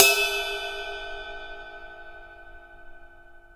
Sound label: 18 RIDE, CYM; Greytsounds VOL-1; SDX HATS+CYM